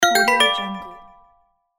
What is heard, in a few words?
correct answer levelup bells